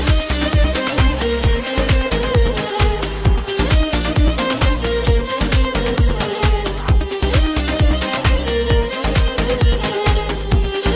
new beats

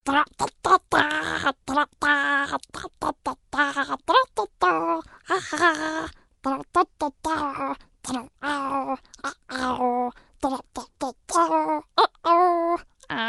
baby murloc

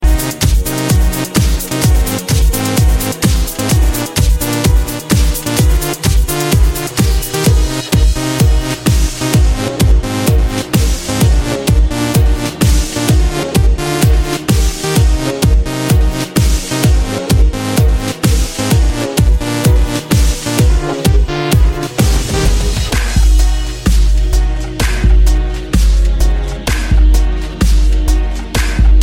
best dance music

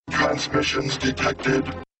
soundwave sms tone; ringtones

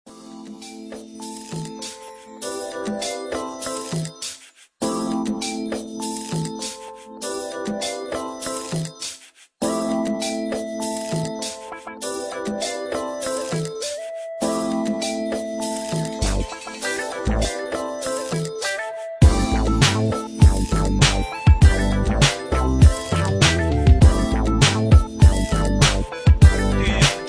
blast beats g funk instrumental

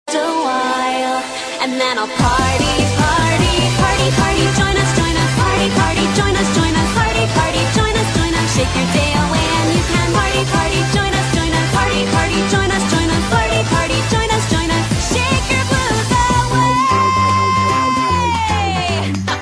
theme song english version; shin chan

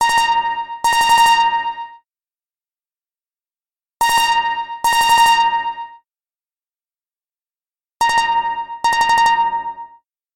Low Pitch

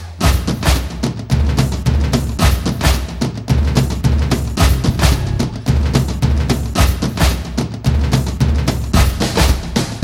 Drums

